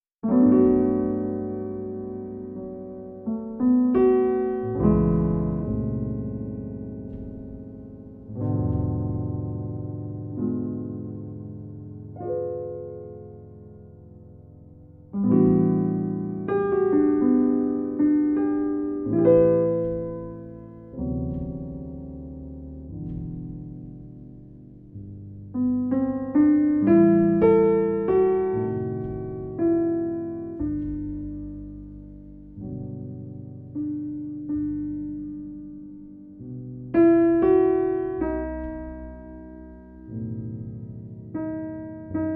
Coffee Shop Jazz Relax Chilled Jazz Background Instrumental Jazz